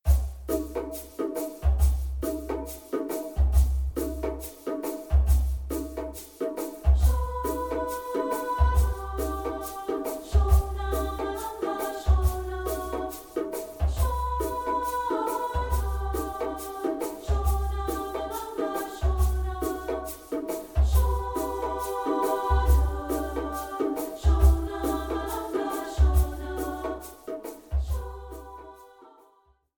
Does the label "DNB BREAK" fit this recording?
No